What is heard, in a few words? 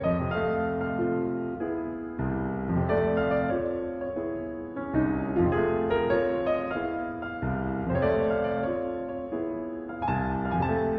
Sentimental Melody, Piano Blues; Copland, Aaron